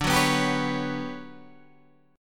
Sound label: G Major Sharp 11th D, chord